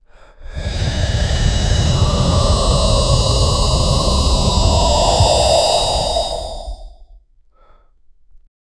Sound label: dragon roaring and breath